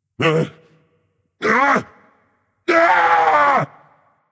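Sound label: non verbal fear, voice; cernunnos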